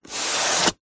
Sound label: foley card box product pack slide open or close; zapsplat